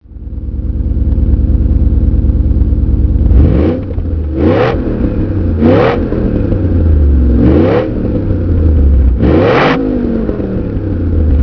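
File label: lancia 8.32 throttle, Lancia Thema 8.32; 1991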